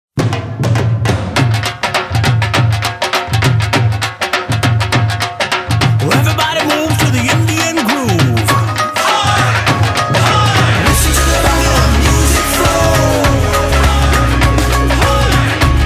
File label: Indian Groove